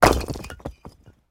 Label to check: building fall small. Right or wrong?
Right